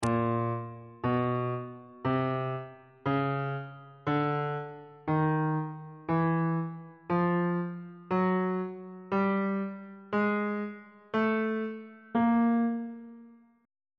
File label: b flat locrian mode chromatic scale 1 octave on bass clef